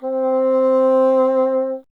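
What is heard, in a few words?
wind bassoon C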